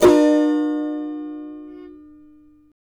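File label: C major, Guitars; Spectrasonics; Hans Zimmer Guitars VOL-2; CAVA CHORDS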